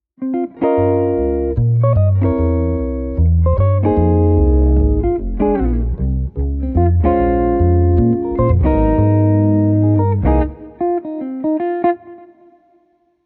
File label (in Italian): strofa 150bpm